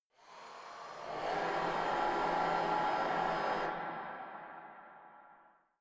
motor function engine, horror

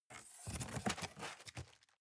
cardboard box open unfold; objekte

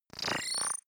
bubble fizz appear, swooshes swishes

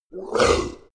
monster attack gurgle low; koerpergeraeusche; comedy-cartoon; soundpool

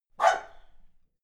dog reverby bark scared; natur-tiere; hunde

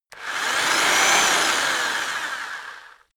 rock drill rev reverse long; maschinen; bohrer

